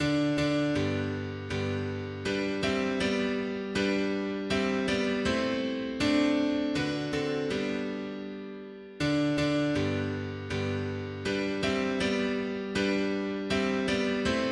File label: mono piano; hymns; Himnario grande